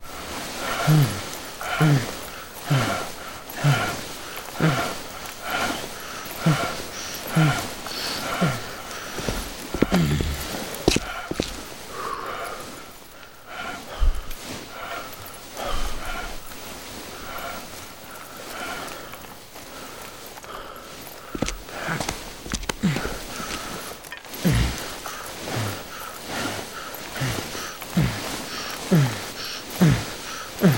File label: pushup guy foley; Modern Warfare